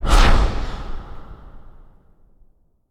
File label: hit fx swipe